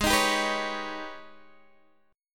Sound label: G sharp Minor Major 11th, Guitar Open D